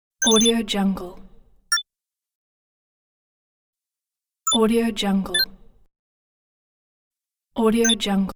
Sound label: five digital button beeps